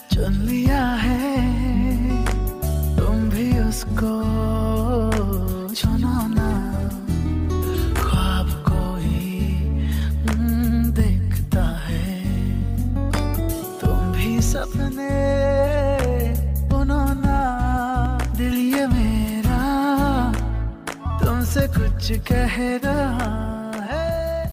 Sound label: top bollywood ringtone